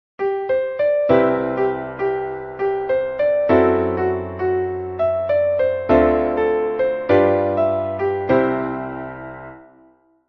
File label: IVm7-5